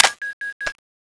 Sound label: reload in